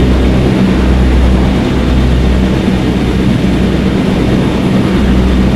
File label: engine tvd cockpit; War Thunder